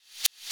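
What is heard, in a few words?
909 clap, drum machines; 300 Drum Machines; E-MU Orbit-3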